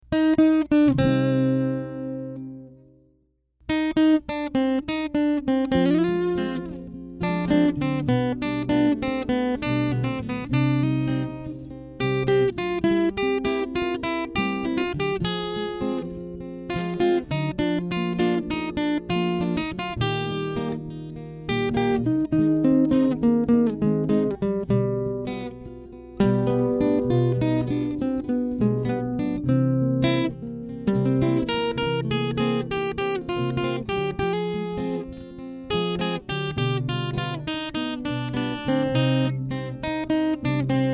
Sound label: instrumental; viola